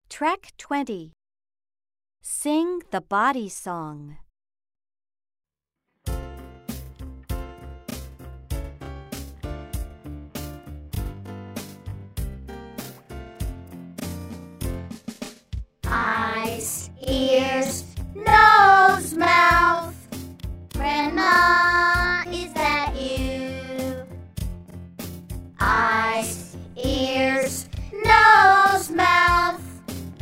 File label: the body song slow